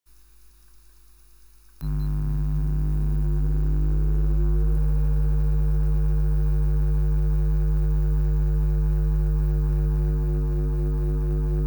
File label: Emissions Fan